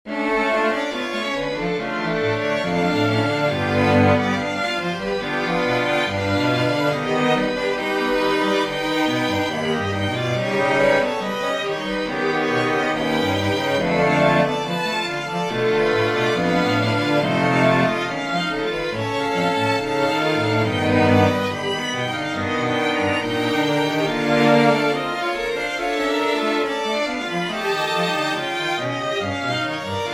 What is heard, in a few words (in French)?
trio pour violon alto violoncelle; cocorullo fabrice bra ves